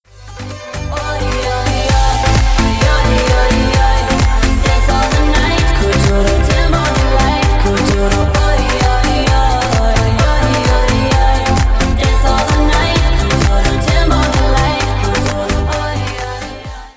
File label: music